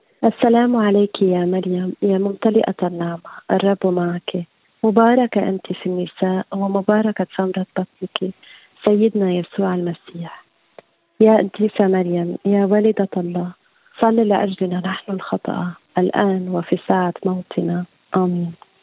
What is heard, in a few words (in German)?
Ave Maria arabisch